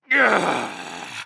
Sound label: Warrior Grunt Fast; Lord Of Darkness; Realm Royale